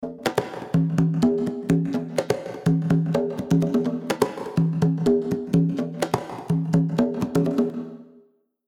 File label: Reverb Pre Delay 0